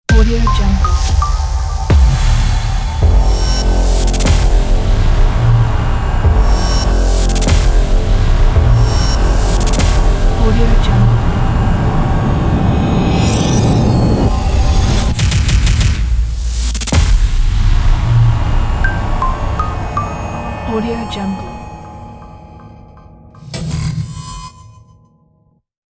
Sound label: trailer intro logo